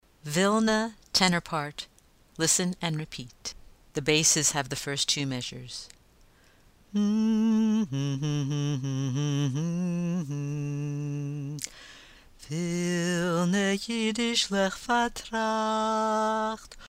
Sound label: tenor verses and refrain drill; Vilne